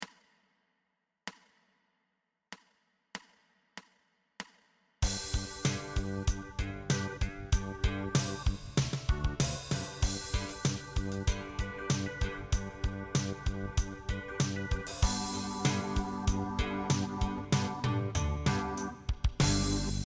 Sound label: Improvising